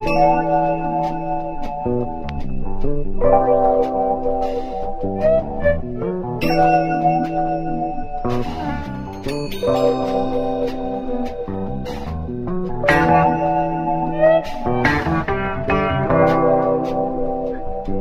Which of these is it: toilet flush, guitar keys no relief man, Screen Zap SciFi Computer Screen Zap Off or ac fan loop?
guitar keys no relief man